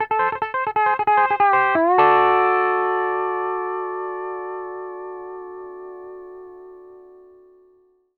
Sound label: PD LOOPS 140, Whole Lotta Country